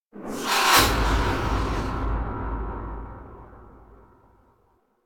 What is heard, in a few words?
Whoosh Sharp Slicing Metallic - Dissonant; Hollywood Edge; sound designer's toolkit v2